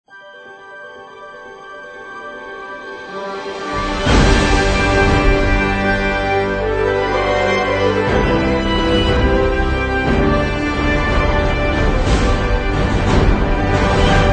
Hollywood Opener Logo, 管弦乐作品根据电影音乐的进步与鼓舞人心的好莱坞风格建立，辉煌的壮丽史诗克莱马克思完全合适的提名，奖项，重大事件，体育成就项目。